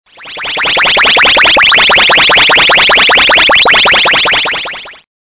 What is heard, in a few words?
drive by alien; Voice Cloak Plus; Acousticons